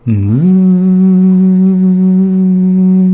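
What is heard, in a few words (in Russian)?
жужжащий звук издаваемый ртом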